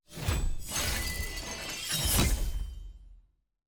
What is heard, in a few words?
celebrate ranked promote to master; leagues